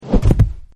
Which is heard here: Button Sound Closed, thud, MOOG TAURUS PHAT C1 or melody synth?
thud